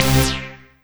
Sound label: Synth Hit C